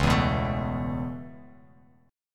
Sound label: C sharp Minor 7th Sharp 5th C sharp Bass Standard; C#m7#5; strummed; JGuitar